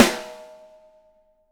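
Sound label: 6X12 ALUMINUM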